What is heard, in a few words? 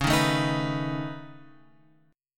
C sharp 7th Suspended 2nd Sharp 5th C sharp; C#7sus2#5 Chord {9 6 7 8 X 7} strummed